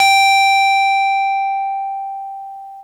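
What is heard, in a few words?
SCARY RHO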